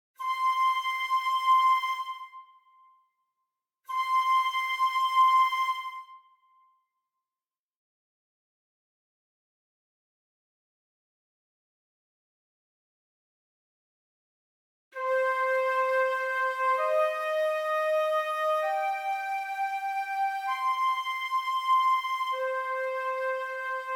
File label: Orchestra Flute; McRoMusic; Summoning Circle